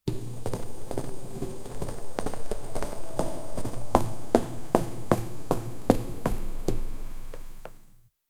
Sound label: gravity boots activation; metal bending